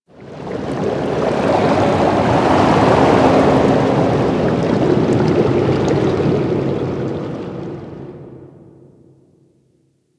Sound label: Ocean Deep Sea Bubbles; Warframe; Grineer Ocean